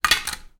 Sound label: household reading glasses drop into bathroom sink